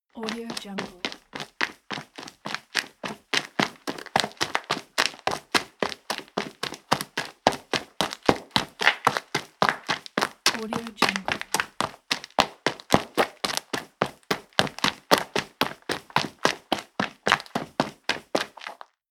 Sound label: running footsteps on asphalt